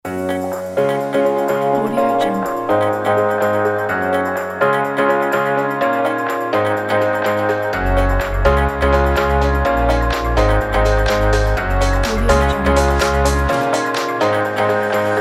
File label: pop summer house; selectedsounds